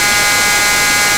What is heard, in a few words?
Loopable Mechanical Malfunction Rumble